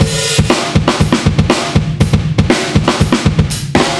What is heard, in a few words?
Full Throttle B, rock